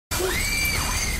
chandelier

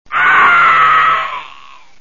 Scream then choked

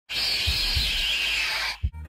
green monster, scary sounds; scary sounds home

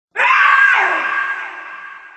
ghost chair

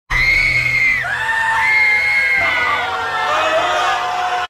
sprint

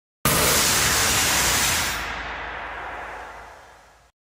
jumpscare; scary sounds

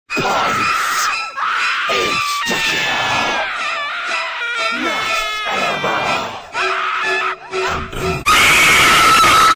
jumpscare; miku; scary sounds

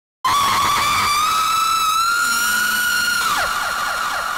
windows; scary sounds

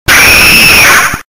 female scream loud

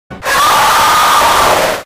ahhh

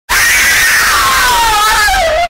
taste death

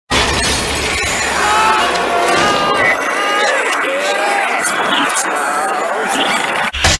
hunching

